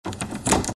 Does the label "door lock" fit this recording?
Yes